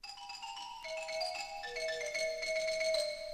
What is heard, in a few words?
marimba; reciclar tocar